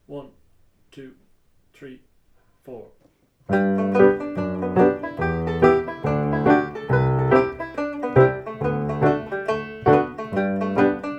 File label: Hornpipe; Cronins Hornpipe